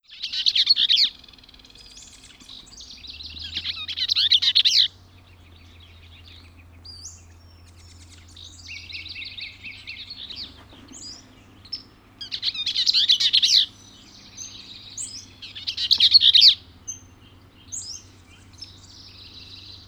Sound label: Bells Least Vireo; Escondido Creek Watershed